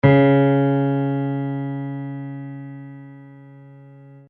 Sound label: Note D Flat